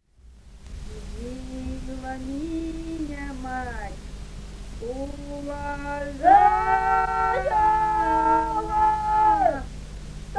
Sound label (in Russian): Любила меня мать, уважала, Чарышское